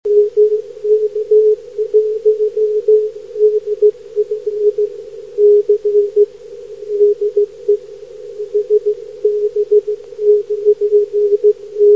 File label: qso; eme